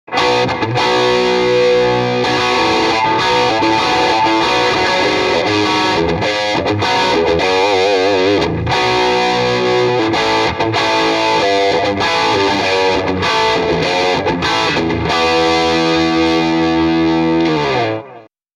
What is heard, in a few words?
lp jtm45 brpup dist